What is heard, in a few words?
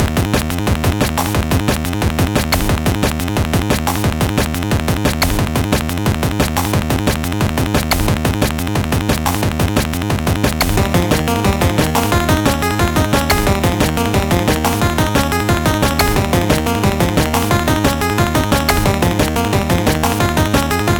Ocarina; Glorky Ocarina; Glorkian Warrior